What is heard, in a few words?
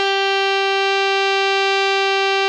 ALTO MF G 3, Sax; Greytsounds; Philip Wolfe David Crozier's Saxophones